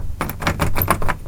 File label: doorknob